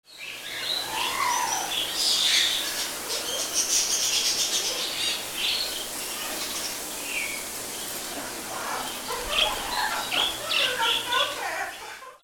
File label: AMBIENCE